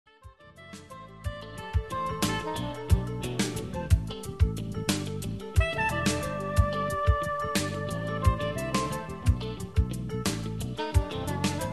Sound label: samba woodoo